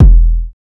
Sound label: BD Sub; VEC1; Club